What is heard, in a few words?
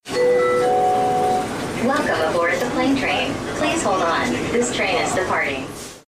Plane Train